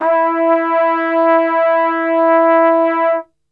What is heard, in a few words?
E 2, Brass; Best Service; Brass Super Section